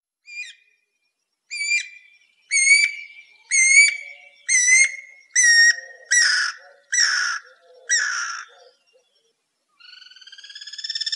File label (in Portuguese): Canto do Anu Branco